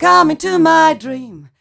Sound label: f mel d flat, fem Vocals